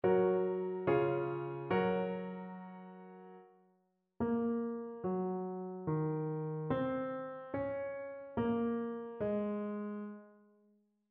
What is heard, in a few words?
melodic fragments